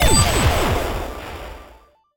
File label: reel Unlock Slot; netent; reelrush